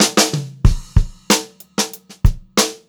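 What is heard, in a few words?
STRAIGHT FILL, Funk-Groove Drumloops; USB Soundscan vol.38; AKAI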